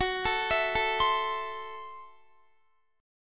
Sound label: Gb Minor 7th Flat 5th, Guitar; Gbm7b5; arpeggiated; x,21,19,21,21,19